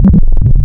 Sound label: birds, wavetable; Adventure Kid